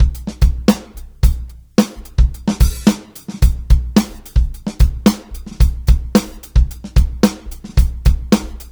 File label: Live Beats, R&B Drums